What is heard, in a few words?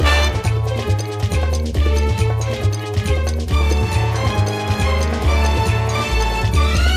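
food world music paradise shooter no guitar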